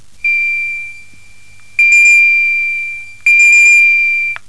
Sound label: bell